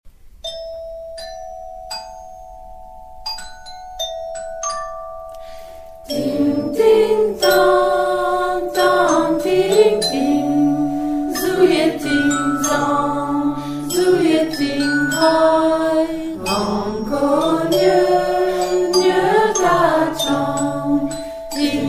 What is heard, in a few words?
Ting Ting tang unisson, chants; Royaumont